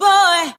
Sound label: "boy" SUNG; ooh ahh